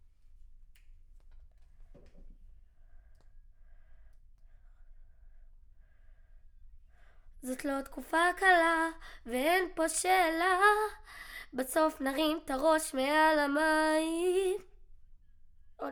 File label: Children Choir; Studio Bekol Children Choir